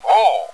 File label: whoo; cstrike; bot